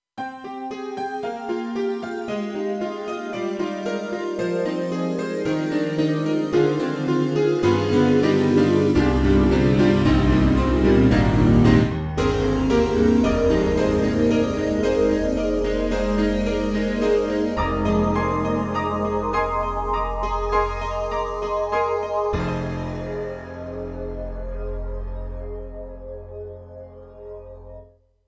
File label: Sparkling Piano; M3 Version 1 Wave; KORG M3 XPanded